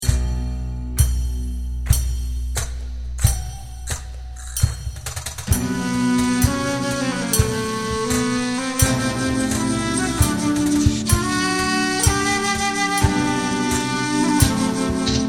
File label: Seguiriya, flamenco flute